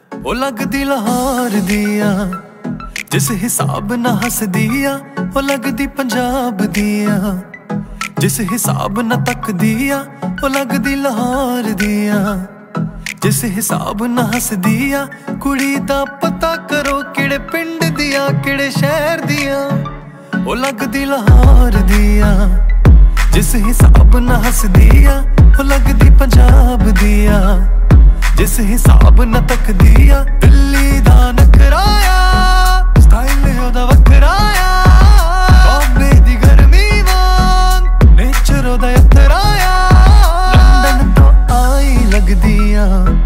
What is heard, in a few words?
Punjabi Ringtone